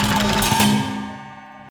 Music transition music segment Boss Fight Phase 2 End; Sifu; Fajar